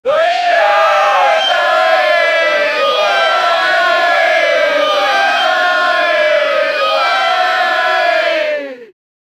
large crowd of hip hop, chant

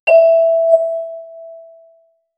high quality iconic eleva; elevator

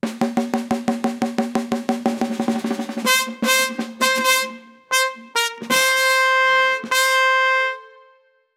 create a victory fanfare, samba

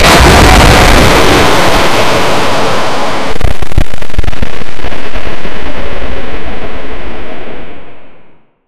explosions

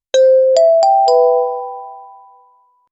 notification sound, chat

